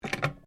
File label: PHONE CAPTAINS OFFICE RECIEVER UP, Sounds onboard HMAS Castlemaine; Boats (Sound Effects:Vehicles)